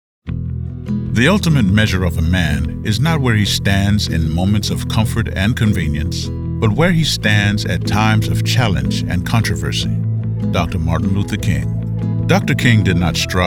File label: Corporate